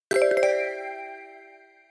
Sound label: short success